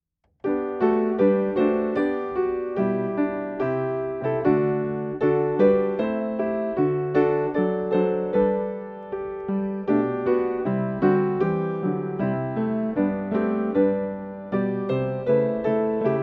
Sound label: Full Accompaniment; O Little Town